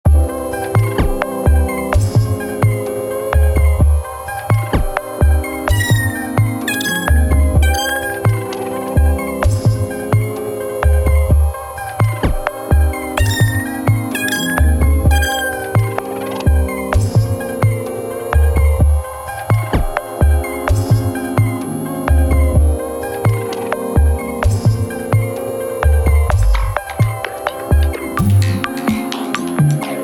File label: processing wait music